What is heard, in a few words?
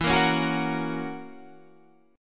F sharp Major E